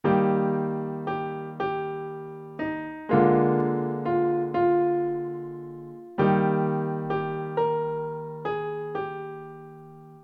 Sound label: practice evening hymn